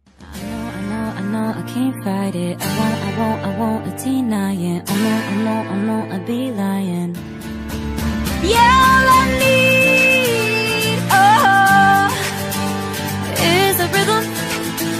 acoustic ringtone

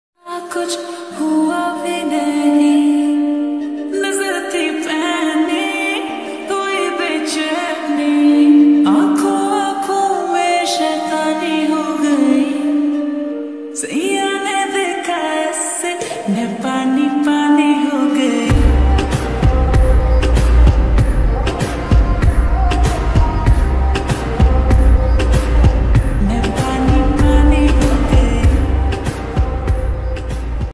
lofi hindi rap ringtone

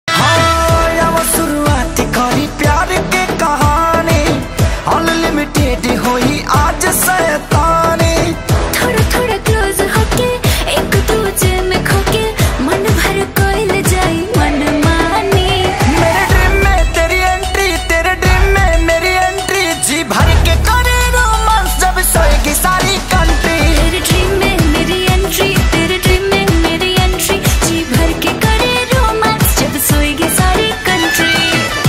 bhojpuri ringtone

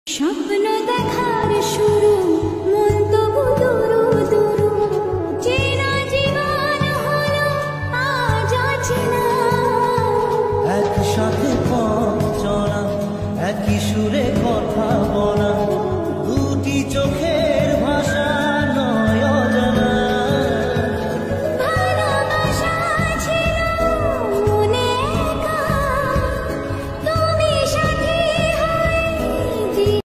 lofi bengali ringtone

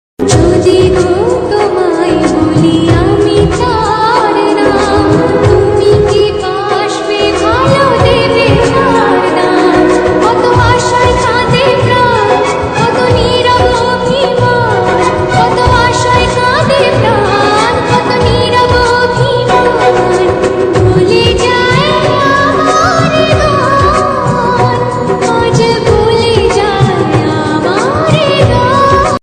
bengali ringtone

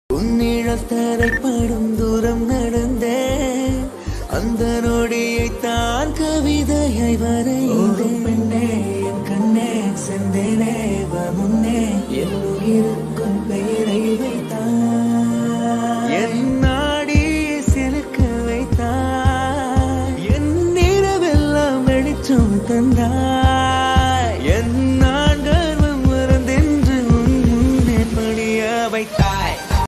tamil ringtone

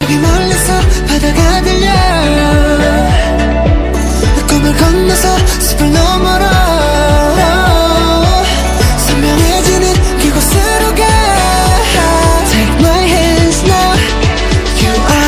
korean ringtone

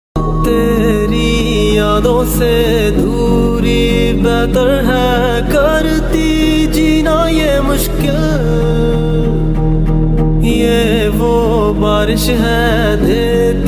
Ringtone

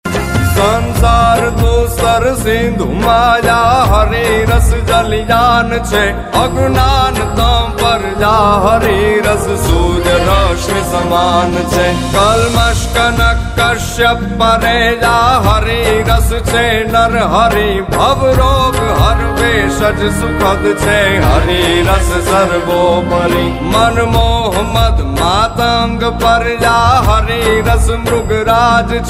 gujarati ringtone

